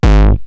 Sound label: Roland TR-909 BD; BD Selection